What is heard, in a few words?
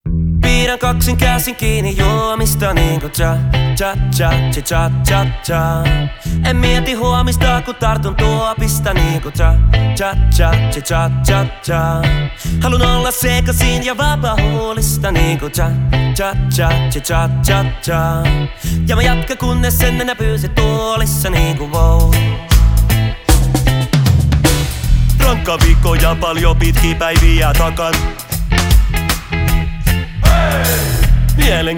cha cha cha reggae mix